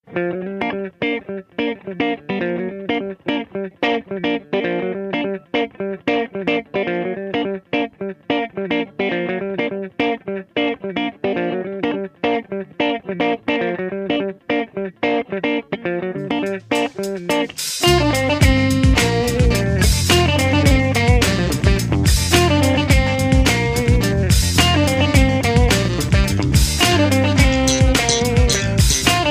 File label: Funky Swing